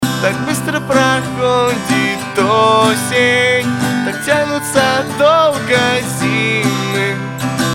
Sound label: vocal with correction